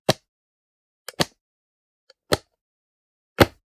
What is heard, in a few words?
Generic Button Wood Medium; boom library; The Interface